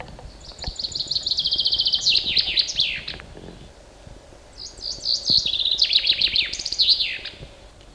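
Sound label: zyablik voice, chaffinch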